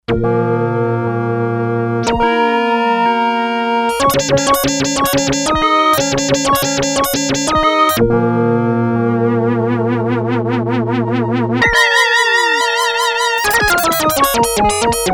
Stack example, Sequential Circuits Six-Trak; Stacks

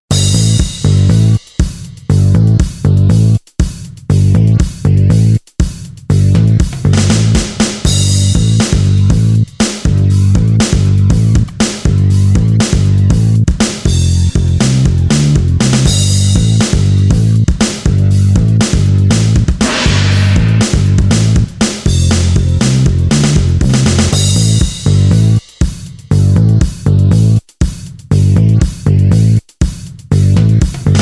Rock Taste, Akai MPC1000

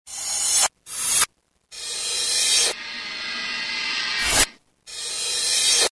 Rev Cymbal; Roland M-DC1

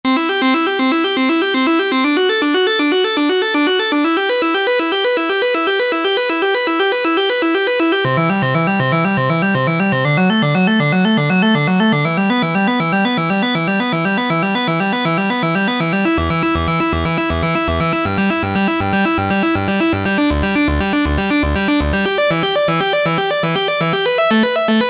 80s Sequencer

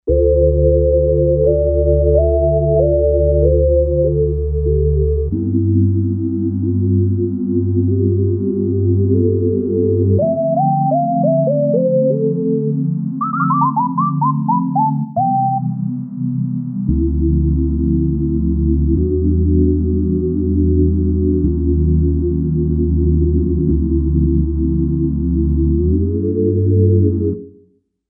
Sinus Pad, Kawai K5000S

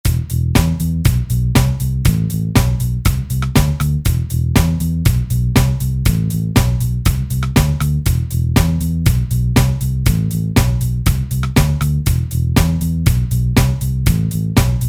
Punk

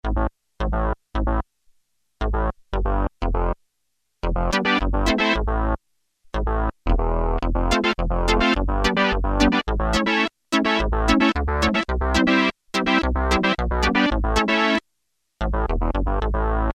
Repeater, Roland Juno-106; Factory Preset

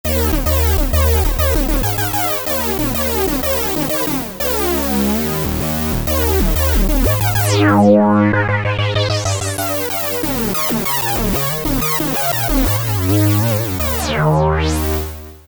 Dirty Sync, Korg MS2000; Factory Patches

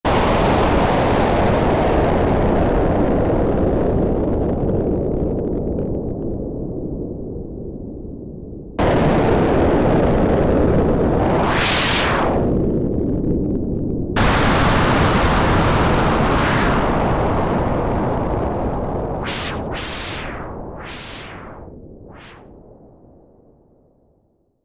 Korg microKORG Thunder; Factory Voices

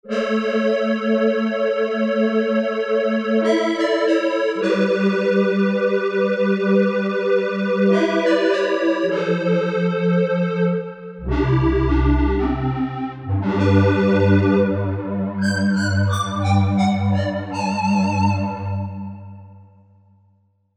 Square Pad, Korg MSC-12S Synth; Synth 3

